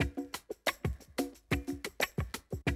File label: Classic DrumLoops